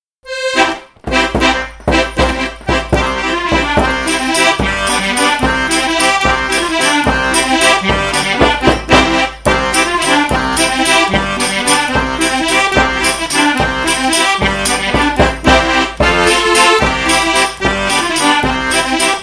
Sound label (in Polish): Oberek; Kostka Olszewskiego